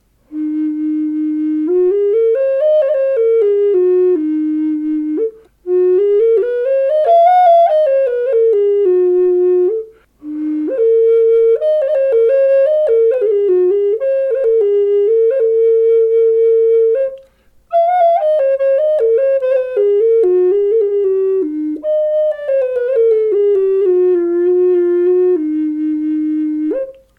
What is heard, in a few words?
Eb4 Spruce, flutes